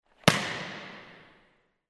mjach v volejbole

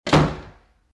hlopok dverju

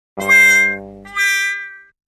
Korotkaia muzykalnaia vstavka truba

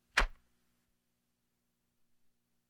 polet volanchika; Звуки игры в бадминтон

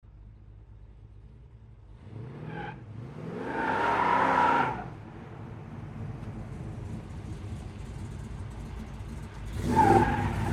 start i prokruchivanija koles

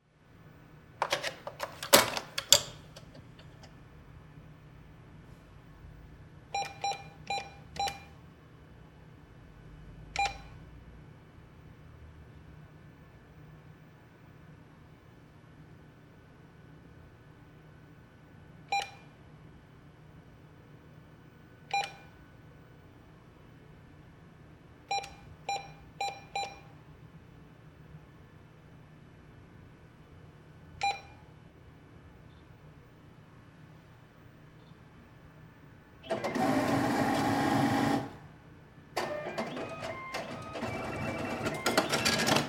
zvuk manipuljacij s bankomatom krupno

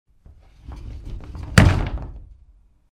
eshhe razdvizhnaja

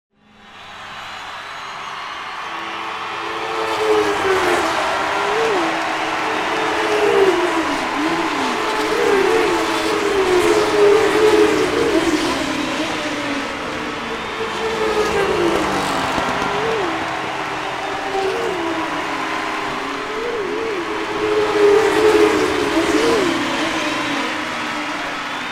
fon gonok s krikami tolpy i proezdami mashin

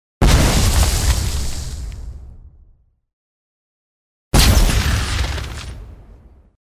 raznyh vzryva; Звук взрыва от бомбы или снаряда

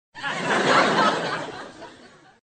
korotky smeh; смех

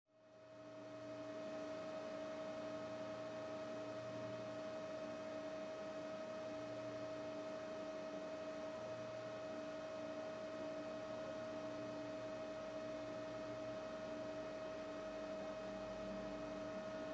gudenie bankomata

